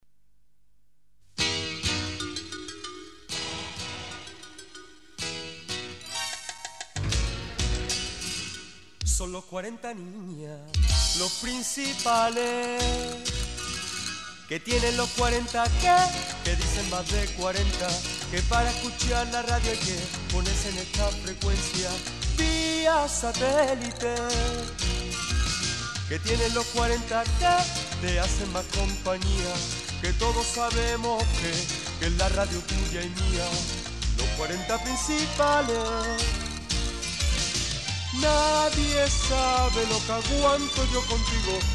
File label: Jingle; 40 Principales